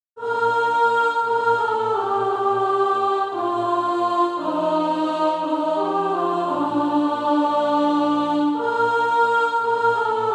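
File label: soprano, SATB Practise Music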